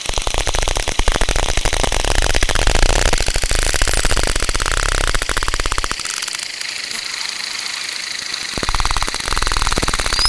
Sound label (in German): Grosser Tuemmler